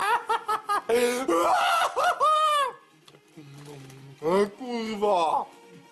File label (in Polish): szalenczy smiech